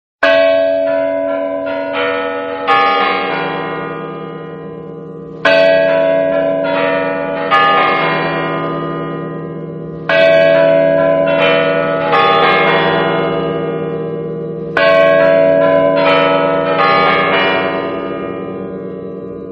Bell The Kremlin

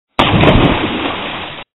Foot Sound Effect

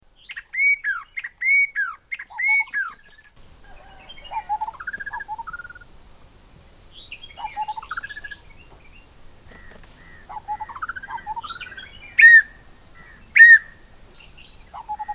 Nature And Wonderful Bird Sounds

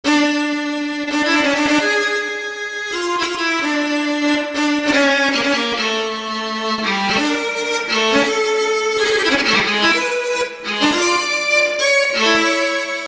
Violin Intro Intense

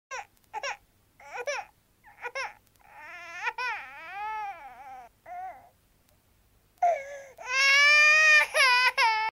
Children Cry

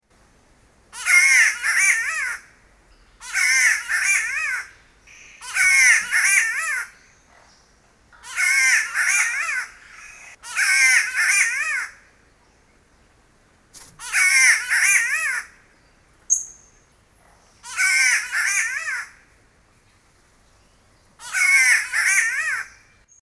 Crying Naughty Cat

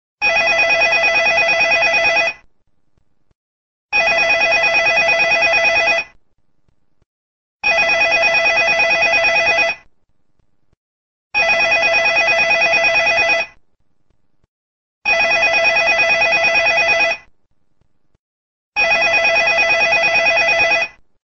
Phone Ringing

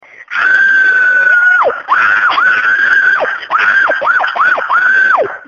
Real Shout Scream

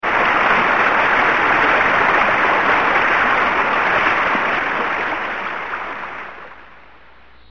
Heavy rain

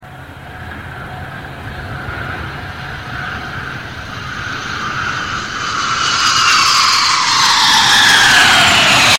Aeroplane

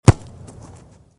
Football kick

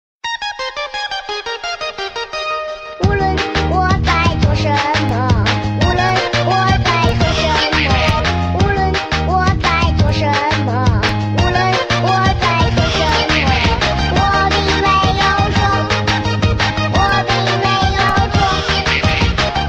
Funny Child Sound

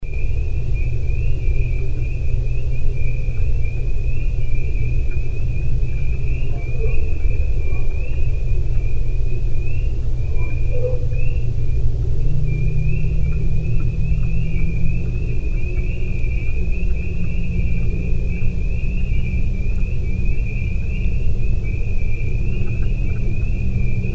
Night And Frog

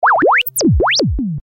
Cartoon Signals

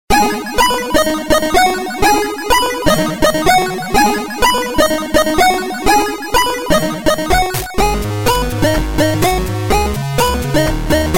Zx Spectrum Ring

